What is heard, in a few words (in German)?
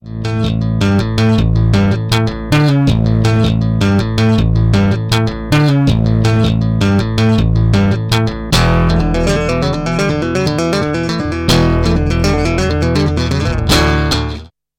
Zerr Bass; WA12; Hi Z; DM24